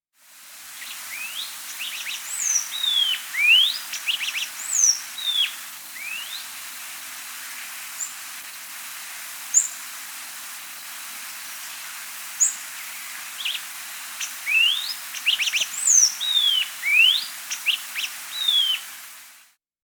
Black-Goggled Tanager (Trichothraupis Melanops), Andean Birds; S. America